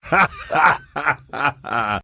haha; svencoop; voice commands

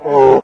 pz idle, poison zombie; svencoop; hl2

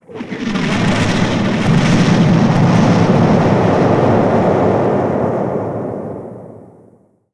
plane fly; ambience; svencoop; urbicide

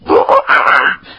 poison crab talk; svencoop; hl2

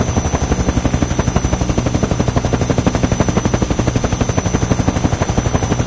heli rotor loop, hunter chopper; svencoop; hl2

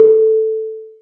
bell; svencoop; urbicide

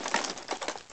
RPG start; svencoop

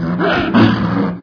alert, zombie; svencoop; hl2

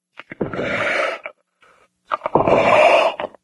pz breathe loop, poison zombie; svencoop; hl2

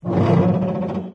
metal stress; svencoop; hl2; ravenholm

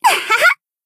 cheer; svencoop; voice commands; hl Nozomi

